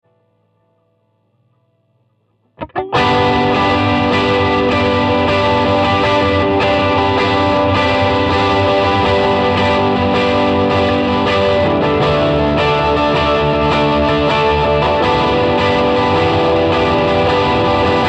Starplayer TV Delay

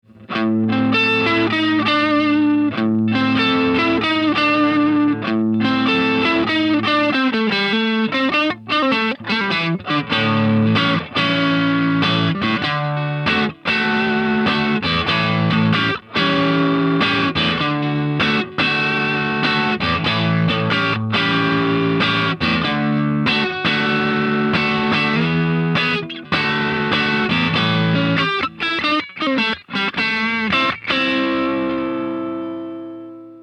Neck Drive

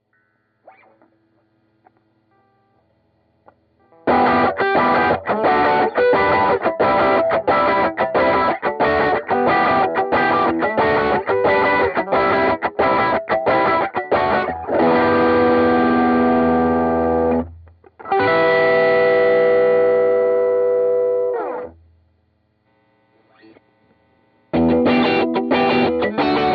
e906 Drive

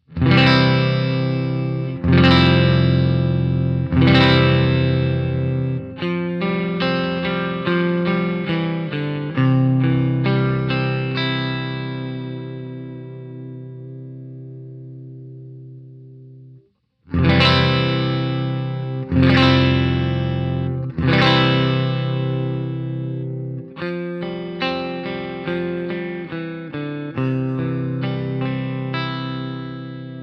Duo Jet Clean

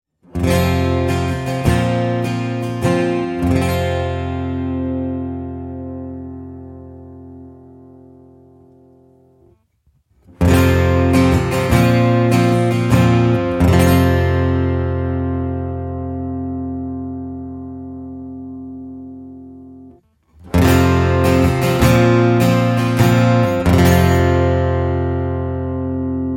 Anthem Setting Sweep

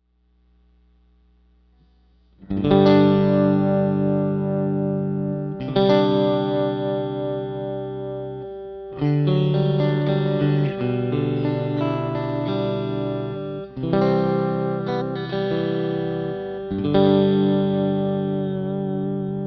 Panther SM57 Clean

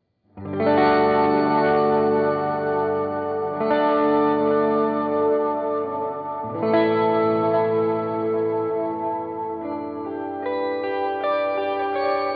e906 Ambient

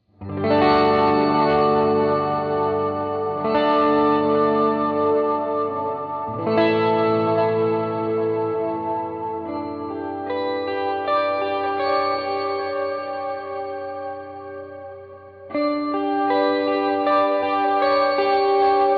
421 Ambient

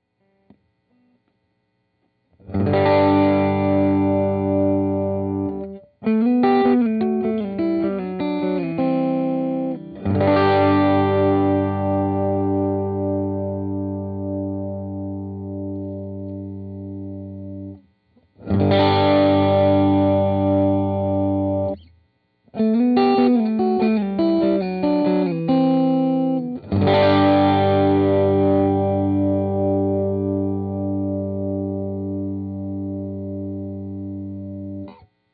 e906 Clean

